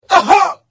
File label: Hit; The Elder Scrolls III - Morrowind